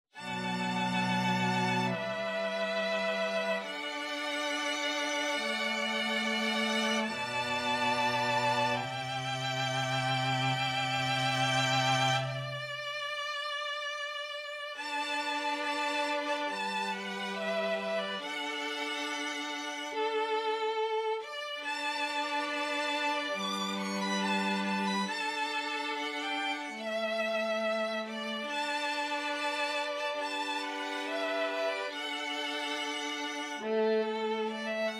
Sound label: STRING QUARTET